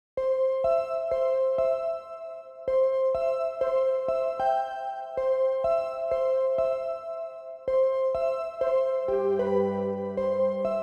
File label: Island Hopping Underscore, Summer Daze; mellow island beats; Narrative Mind